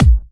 Hard Kick; Vengeance Samples

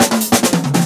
Loops Fills; Vengeance Samples

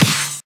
Snares & Claps; Vengeance Samples

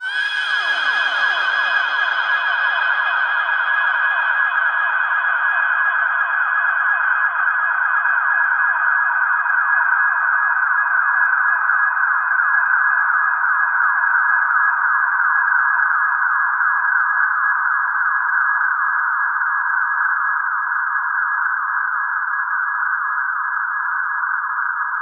Reverb Athmospheres, FX Vocals; Vengeance Samples

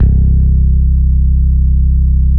Basses C; Vengeance Samples

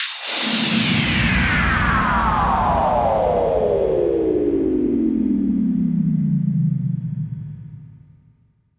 Downlifter

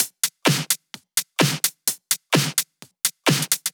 Drumloops 128BPM

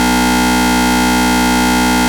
Root B, Ultimate Bass; Vengeance Samples

